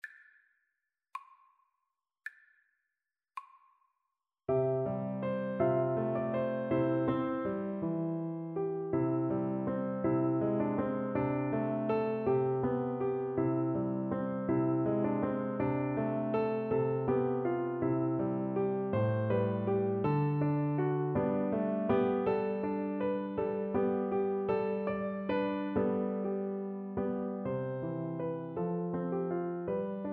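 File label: christmas song vlc karaoke, Cello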